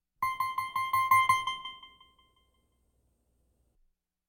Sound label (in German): kosmische Glocke wiederholt; Science Fiction